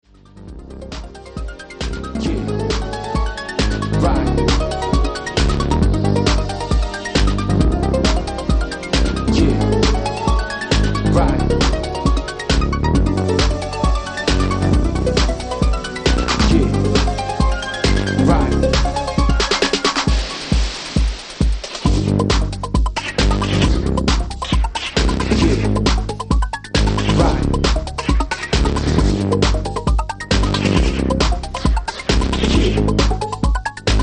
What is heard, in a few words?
Arp Surface